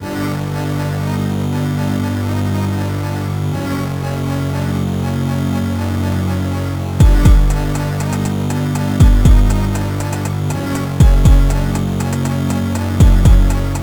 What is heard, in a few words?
evil video game boss thing slowed